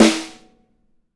YAMAHA SNARE

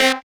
Synths Root C

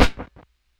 SNARE GHOST, DRUM N BASS KIT; JUNGLE BEATS

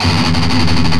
Riff, Industrial; Nasty

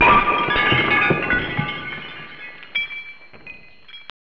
GLAS DROP, METAL & WOOD; Roland - LA Composer vol. 1

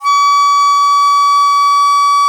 DIZI C#5; E-MU Producer Series Vol. 7 – Old World Instruments

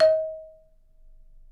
D#4, Percussion & Harp; Miroslav Vitous - Percussion & Harp

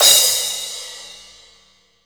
AIR CRASH OFF, Crash Cymbal; MONO CRASH; AKAI S6000 CD-ROM - Volume 3

